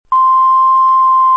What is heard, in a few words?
WHISTLE